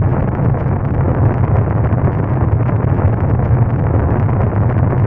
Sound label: engine; Privateer